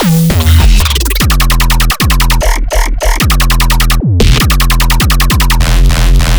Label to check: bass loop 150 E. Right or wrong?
Right